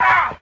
ow

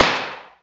gun shot; Crash Bang Boom

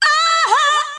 "uh huh", sung; ooh ahh

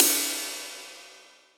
Cymbals, Electro

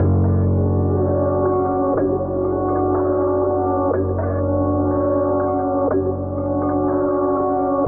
keys, Loops, Sad

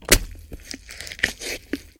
bone break stab

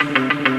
guitar four notes

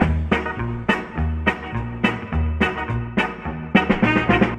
horns, Loops

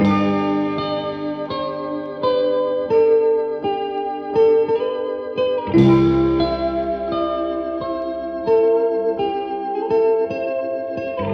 guitar, Jazzmatazz Loops

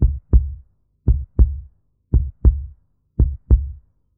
hartbeat; Drum loops

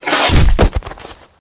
bone break behead

